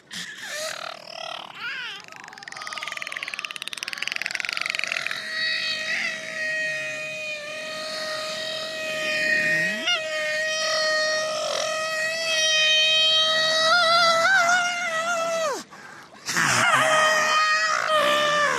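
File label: beluga; beluha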